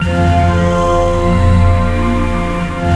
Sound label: DEEEEEP; WORS STATION; BODY BEAT